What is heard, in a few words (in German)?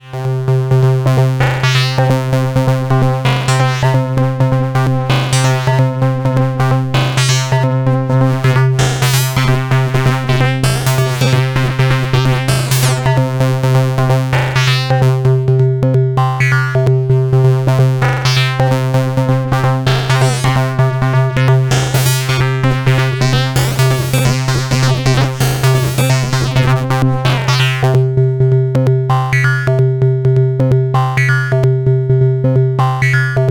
Rossum Electro Music Trident Zing2 ModOSC1 FM moduliert ModOSC2